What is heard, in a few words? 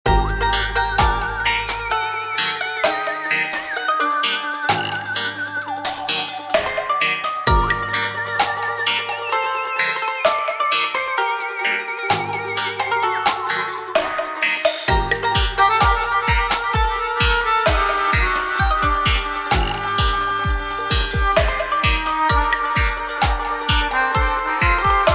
dub